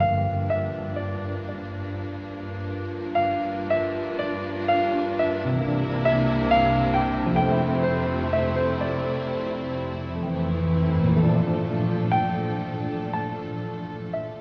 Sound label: piano mourning strings, Loops Sad